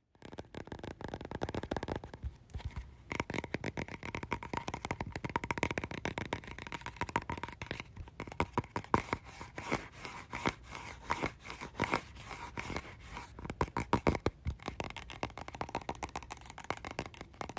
TUBE

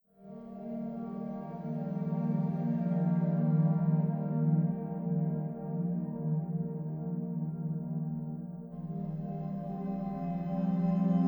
Jazz Music Therapy for Cats

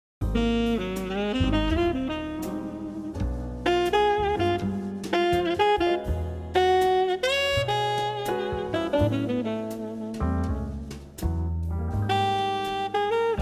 Romantic Evening Jazz

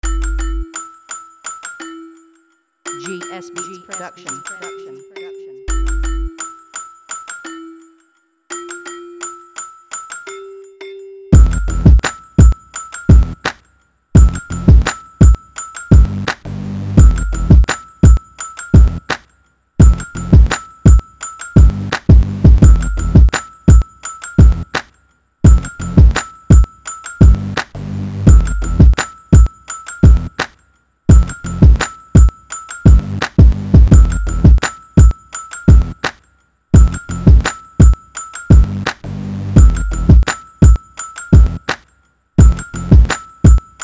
trip beat

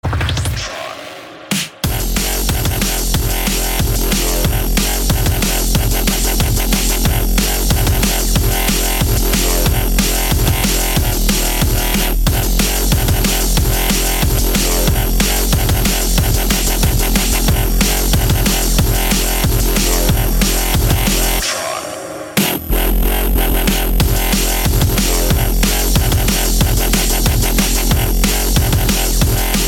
dub

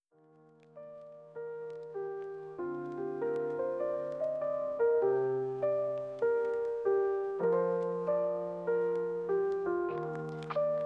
Lofi

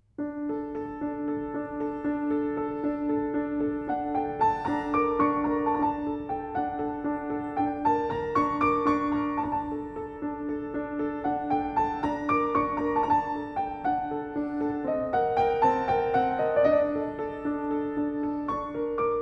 Mystic Flute

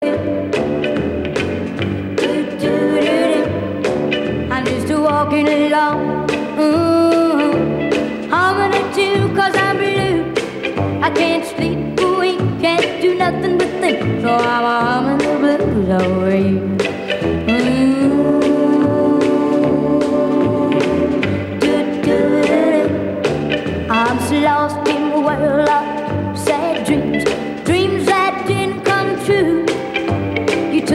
Humming the Blues

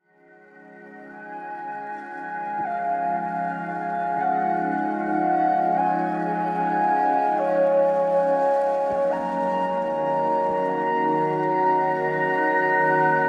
Wind on Grass

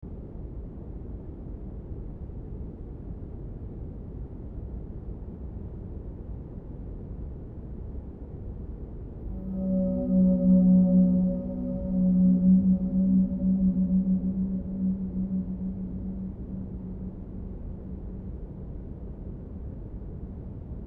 Binaural Whale Sound

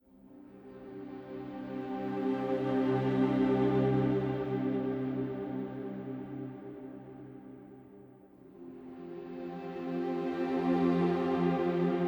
Water on Mars, Meditation Spa Reiki Calming Music

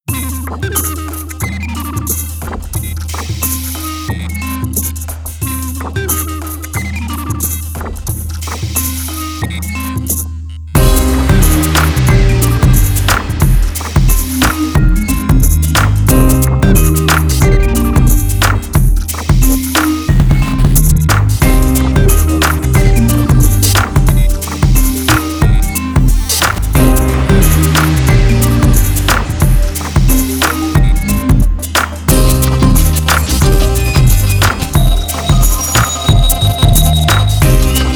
Punchy Noise

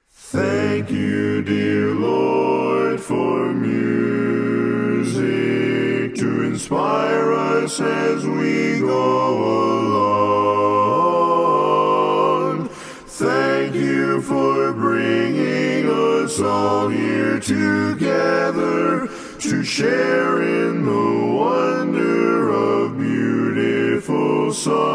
Barbershop Quartet

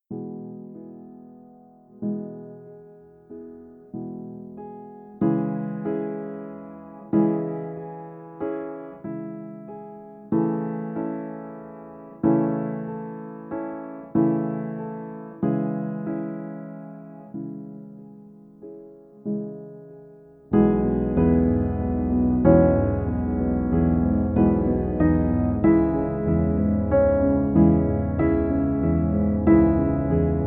Piano

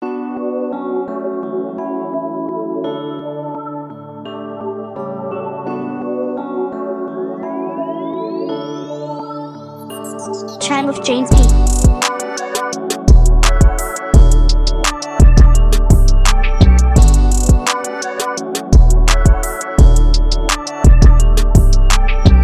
Beats

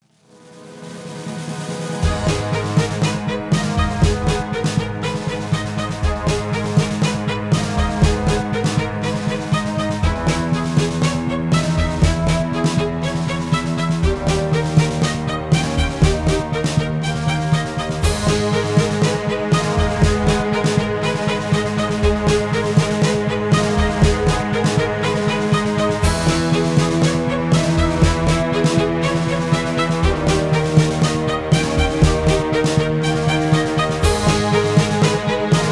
Epic dramatic opening

